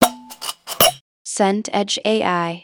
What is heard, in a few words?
jar lid twist